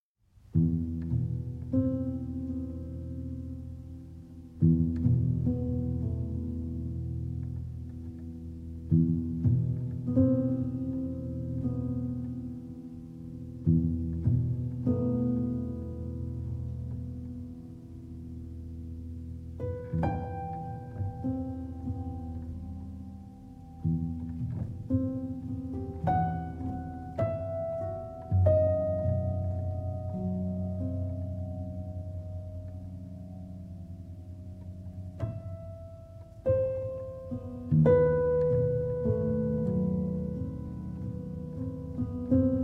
Nocturne in E Minor for Piano; Op. 72, No. 1

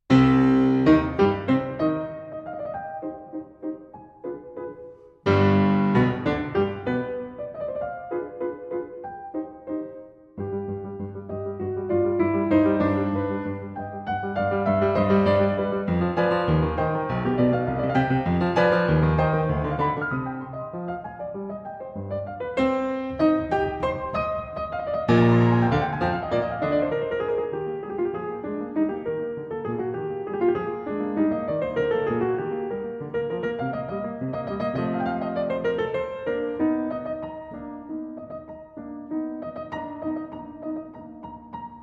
Piano Sonata No. 14 in C Minor; I. Molto allegro

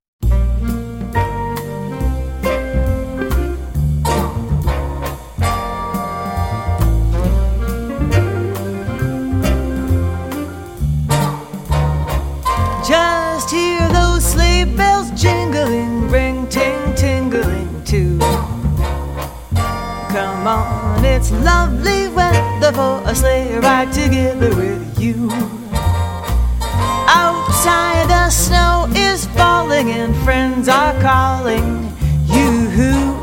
Jazz Orchestra

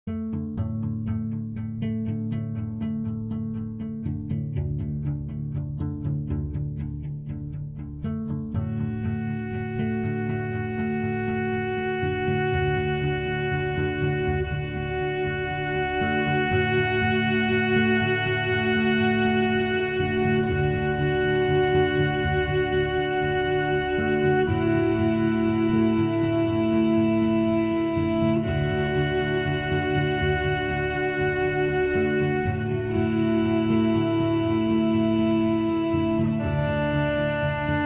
Ambient

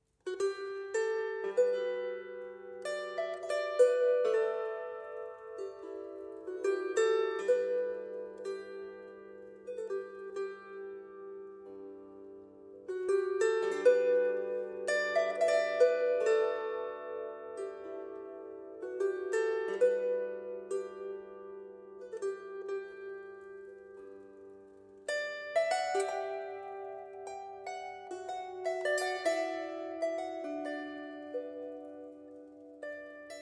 Traditional, Violin and Harp; Codladh an Óigfhir

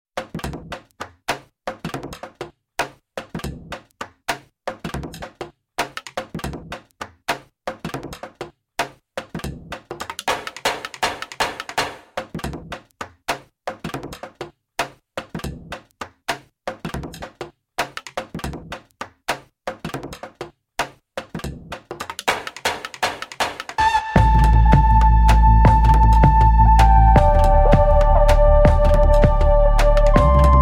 Instrumental Version

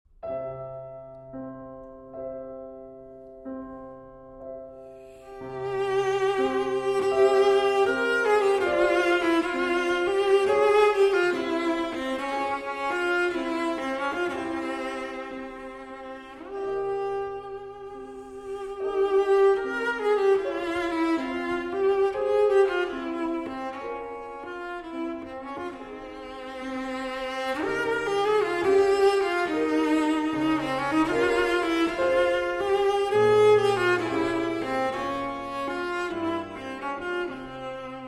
Romanian Folk Dances, Dance from Bucsum; Six Romanian Folk Dances, BB 68, Sz. 56; Arr. Székely Transcr. Maisky

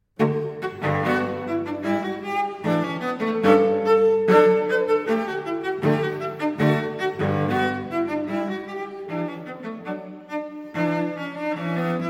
Cello Solo, Gavotte; No.6 in D; 5. Gavotte I-II

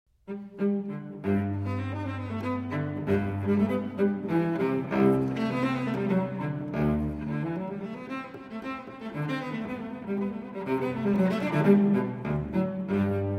Unaccompanied Cello Suite in G Major, Courante; No. 1; BWV 1007

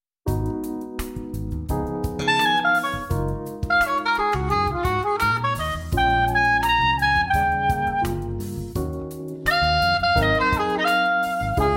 Mellow Jazz Cafe

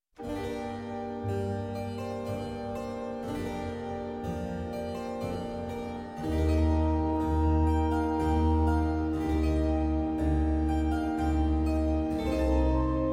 Adagio in G Minor

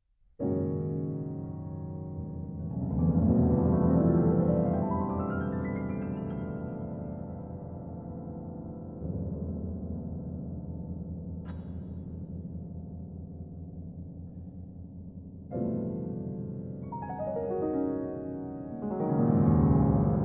Sleepily (Grand Piano)

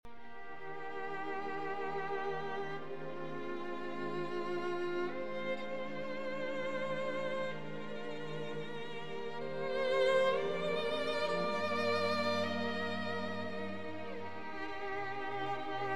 Violin Concerto in G Minor, Adagio

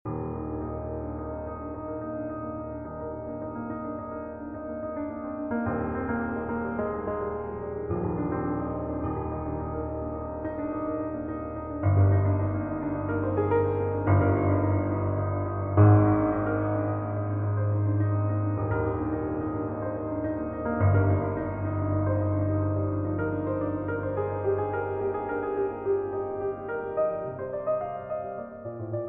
Invention in E Major

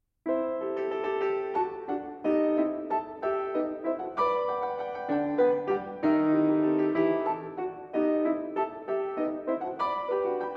Divertimento For Piano III. Allegretto Giocoso

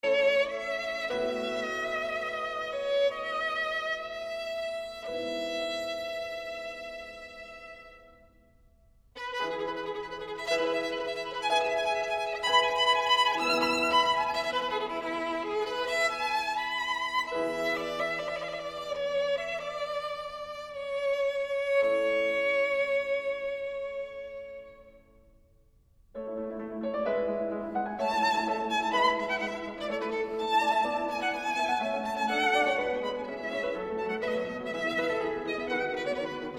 Violin Sonata in A Major

